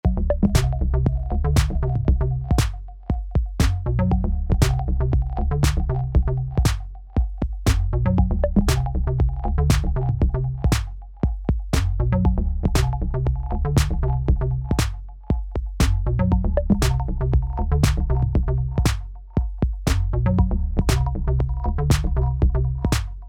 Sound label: 118bpm